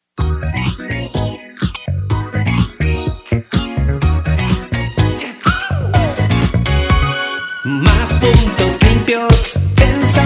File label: JINGLE; SANTIAGO RECICLA